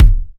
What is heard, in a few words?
Dope Kick Single Shot F Key, Royality free steel kick drum tuned to the F note; kick drum samples